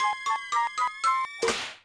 Frog; Animal Genius

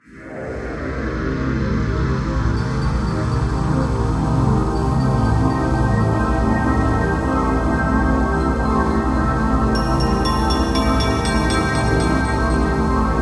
Dungeoneering Floor Track

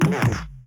perc; gonner linux macos ps4 switch windows xbox one gamerip 2016

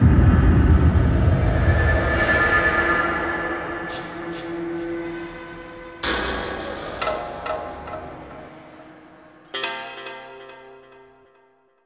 Gameover

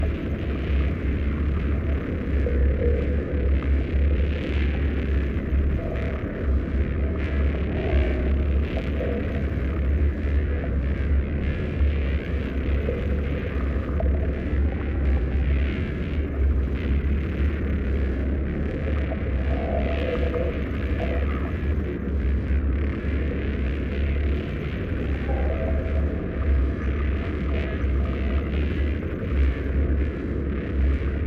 Effect Portal Loop; Verlet Swing

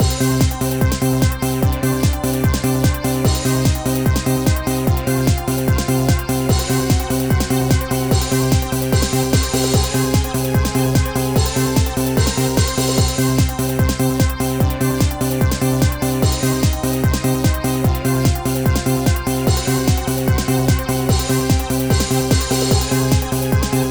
Techno; Scooby-Doo Who's Watching Who; nds

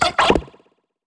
down scream

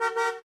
Transport Sold; virtual city windows gamerip 2009

